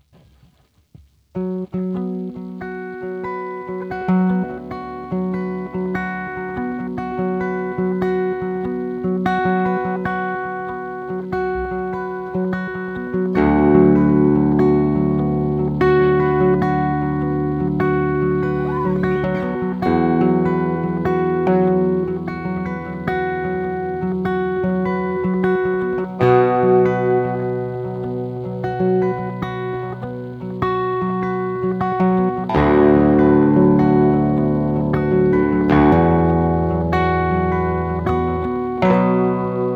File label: tone example fishman ndsp defaults